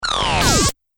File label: Squelchy Laser Power Up